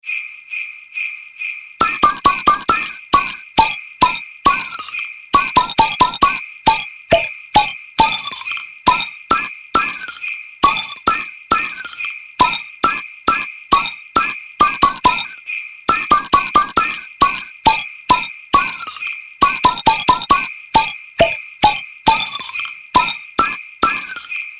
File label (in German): Klingelton Froehliche Weihnacht